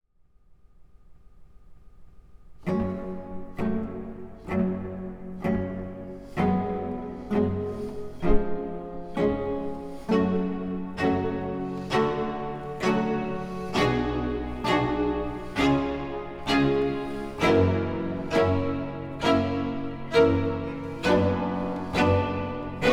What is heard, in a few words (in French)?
Concerto pour deux violons et violoncelle Adagio e spiccato; The Four Seasons